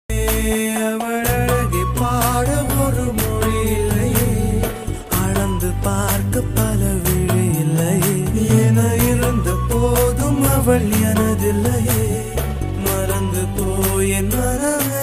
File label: tamil song; Lovely Runner